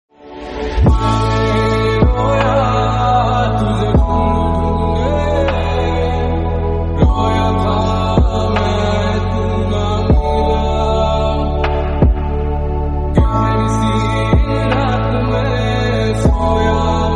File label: lofi remix, hindi song